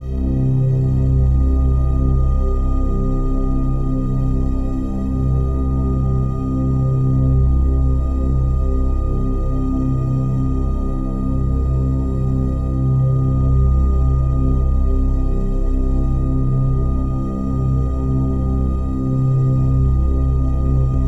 Atmos First One, Synth; Loops; Tonal; Ableton Live 12 Lite; Core Library

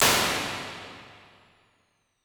Plates Plate A; Impulse Responses; Ableton Live 12 Lite

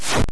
wind racket; MMBNMMOP

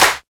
Clap, Drums; One Shots; Last Min; Ableton Live 12 Lite; Core Library

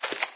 foot walk; There; ThereClient

